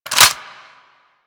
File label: Weapon Jam Slide C; Grineer